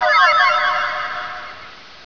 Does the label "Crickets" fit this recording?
Yes